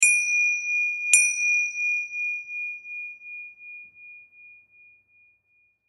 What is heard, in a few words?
Tibetan Tingsha, Tibetan Tingsha Hit Ding Double Warble; Cymbals; Blastwave